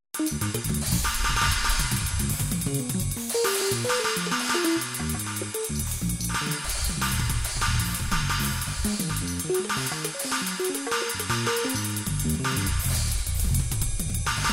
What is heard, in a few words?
Rain Trance; SwarmMusic